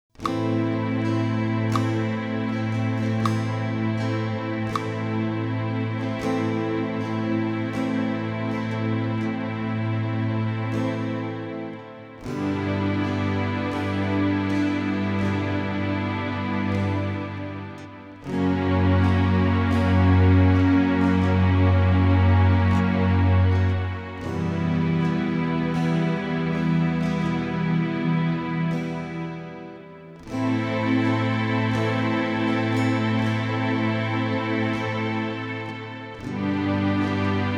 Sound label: backingtrack